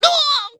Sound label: Die